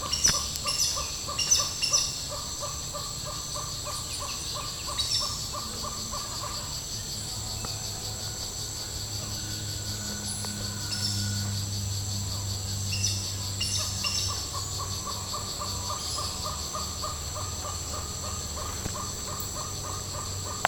Aracari

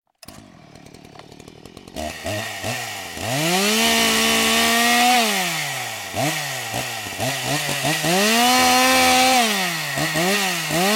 chainsaw sound

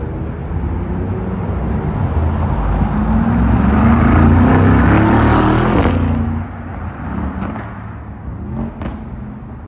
G Class exhaust

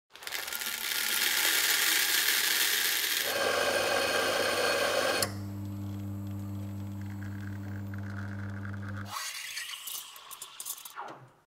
Coffee grinding

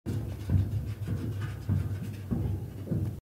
Walking on metal

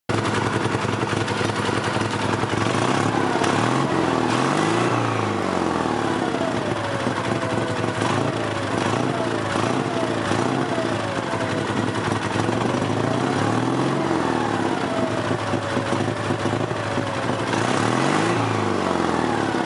Dyane, اگزوز

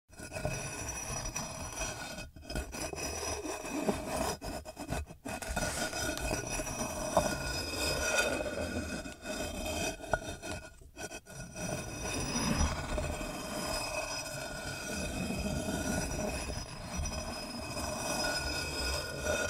scraping iron

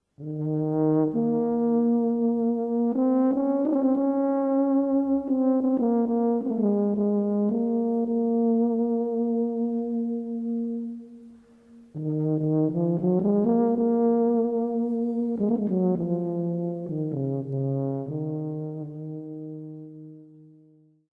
TUBA SOUND; ساز توبا

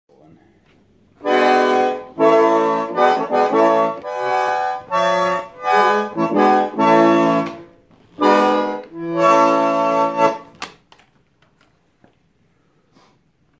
Concertina; کنسرتینا

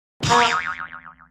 Dizziness cartoon; کارتونی سرگیجه

